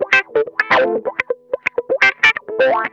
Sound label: WASPISH, Funk Guitar; Zero G - Funk Guitar